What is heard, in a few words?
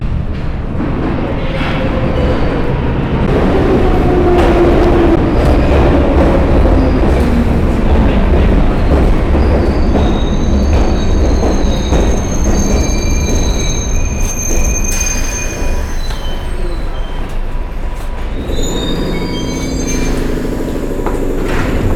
Tube, London; Walkman Performance